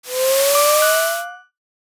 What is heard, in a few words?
flashback bloom